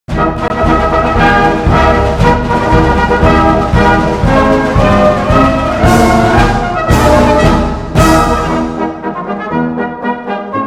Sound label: Dixie Instrumental